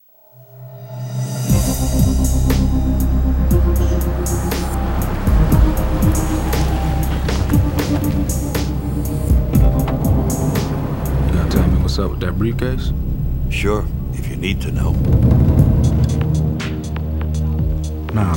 INT Building Explosion; True Crime New York City; Xbox

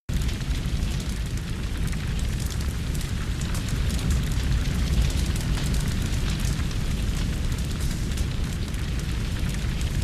sound Firepot

